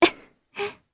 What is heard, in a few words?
Laugh, jp; Hilda; kingsraid